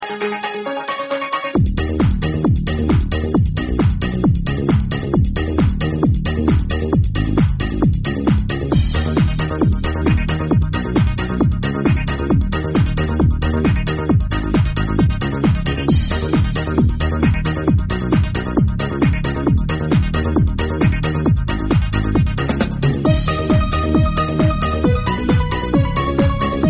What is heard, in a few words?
instrumental club mix